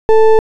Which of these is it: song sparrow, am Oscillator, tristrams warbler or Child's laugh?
am Oscillator